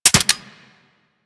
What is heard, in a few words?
Lever Action Rifle Mech, Weapons; Warframe; Tenno